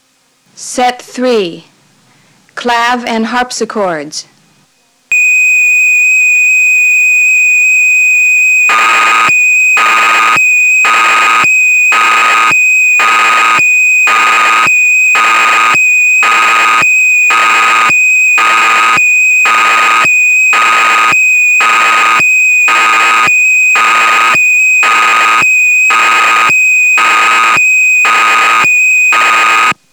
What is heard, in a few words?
Clav And Harpsichord; dx9